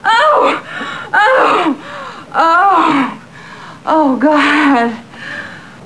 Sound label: Orgasm; PowerOrgasm 1.1